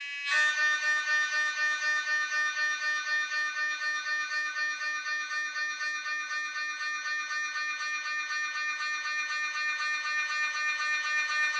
siemens 3t magnetom prisma fit whole body human scanner mri